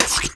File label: Swing; Charlotte's Web: Wilbur and Friends